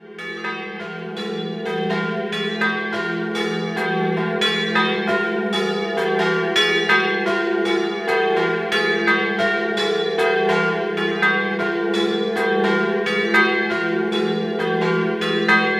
San Foca, campane

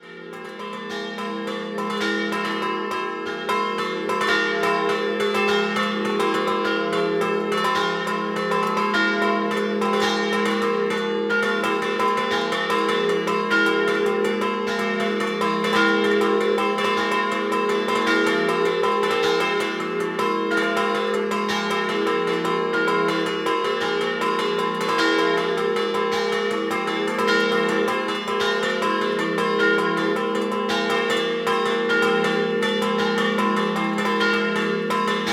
Pignano Scampanottata, campane

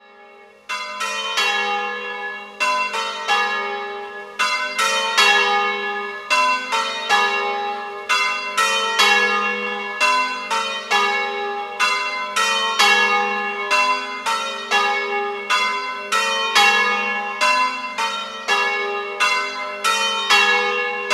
San Cassiano Di Livenza, campane

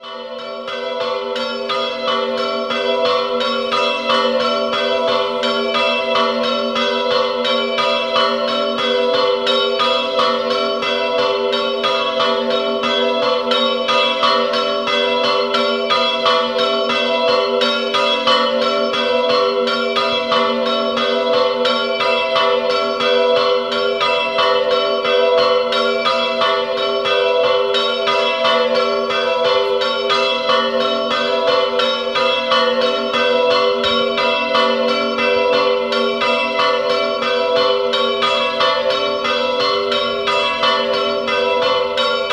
Corva, campane

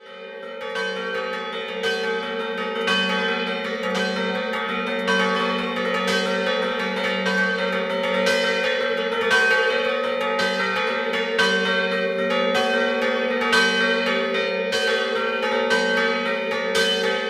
Caporiacco Scampanottata, campane

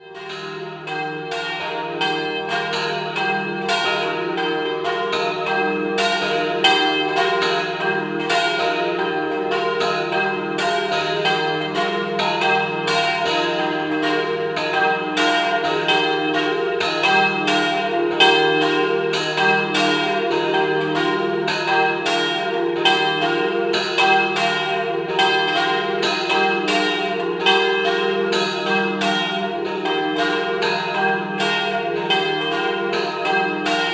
San Giovanni Di Polcenigo, campane

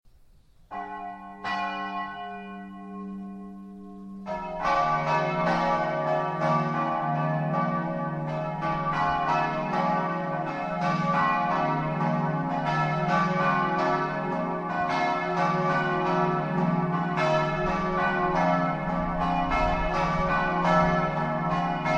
MOIMACCO, campane

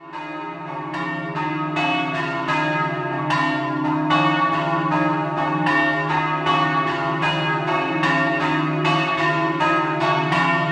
Maniago, campane

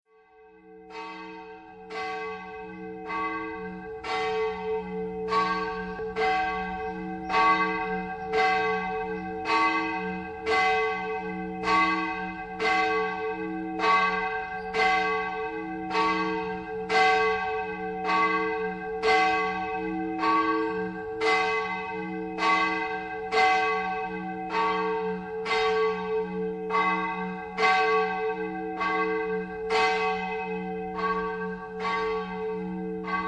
CIVIDALE Duomo, campane

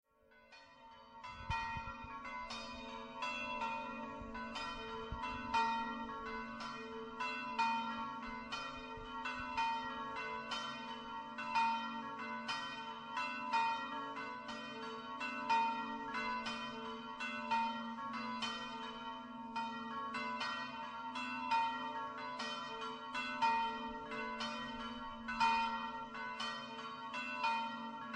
ZEGLIANUTTO, campane

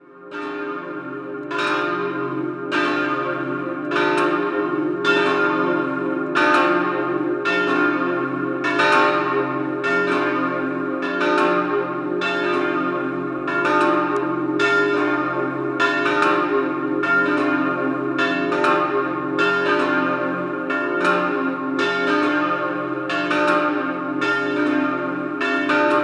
Sequals, campane

